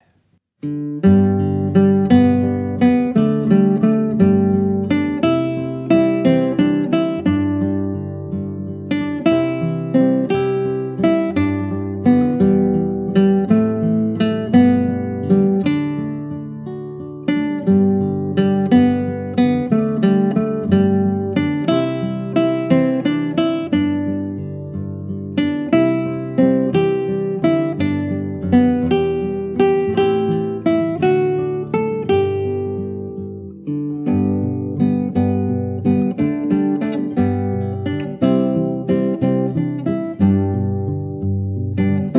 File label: Northern Lights, classical guitar; PlayClassicalGuitar